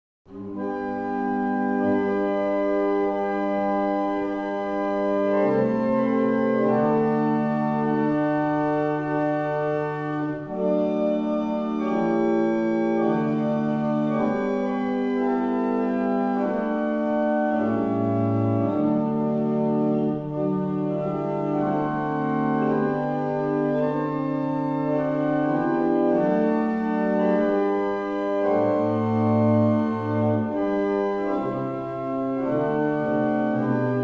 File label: Morning Hymn, barrel organs; Avington